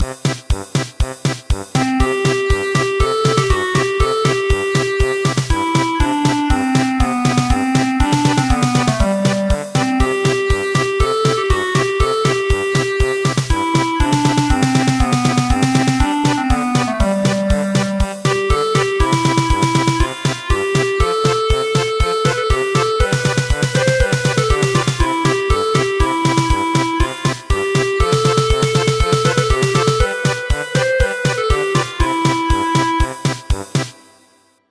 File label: German Polka, traditional; That Little Bunch Of Flowers